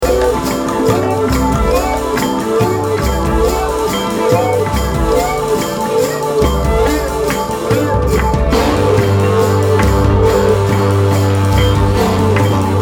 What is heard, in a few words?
house music